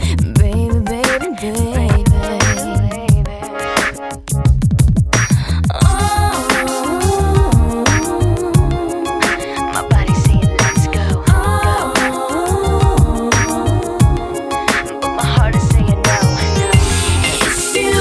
pop

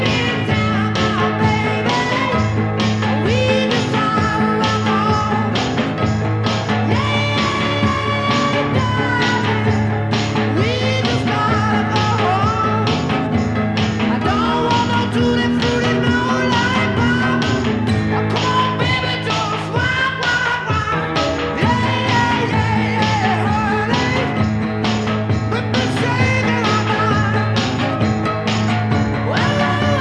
rock

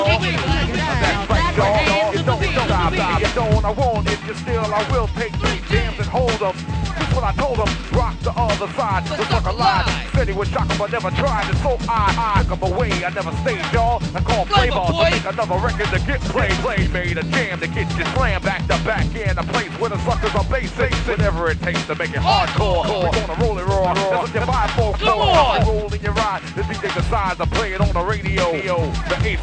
hiphop

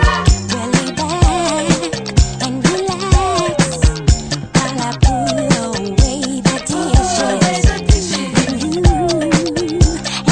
disco

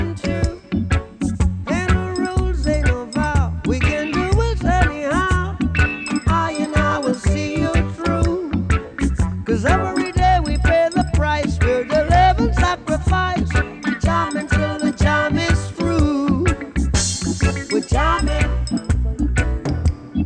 reggae

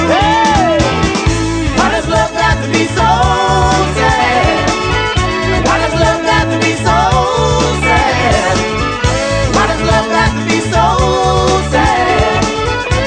blues

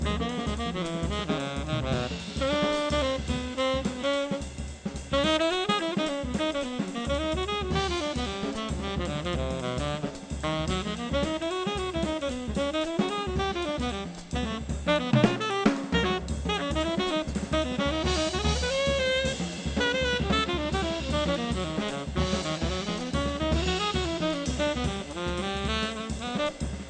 jazz

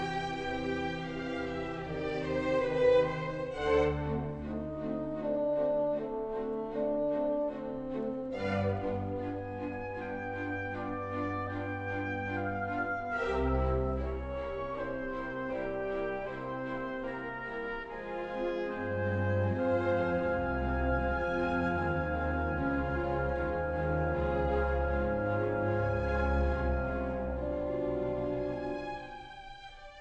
classical

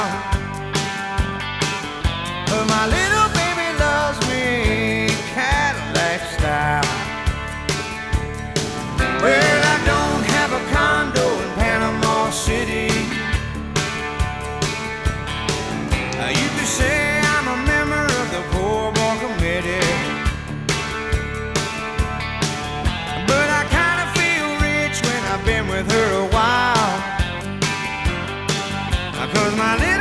country

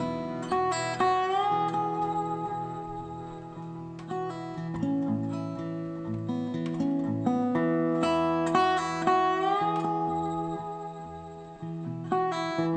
blues

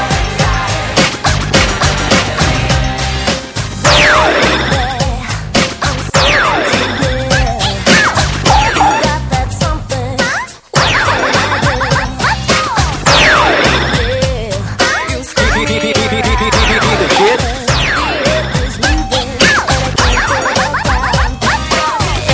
pop

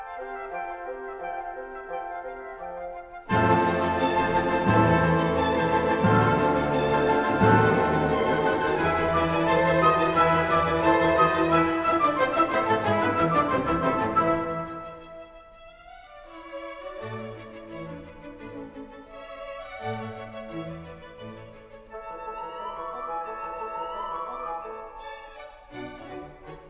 classical

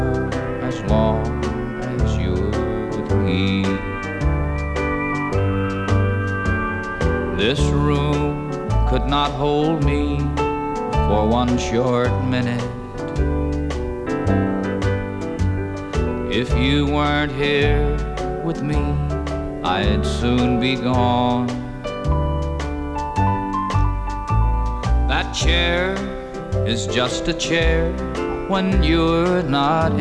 country

